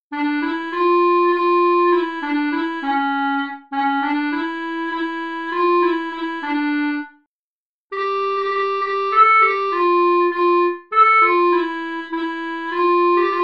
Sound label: basse